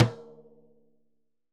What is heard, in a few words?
Surdo Drumhead; Best Service; Festa Latina VOL-1; Single-Hits